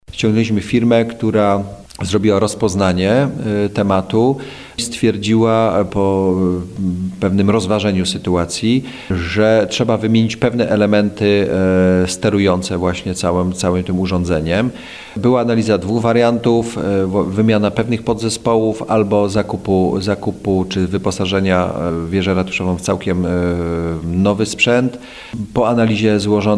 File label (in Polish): hejnal